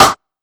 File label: Ragga Rim, Drumset; Roland SH-32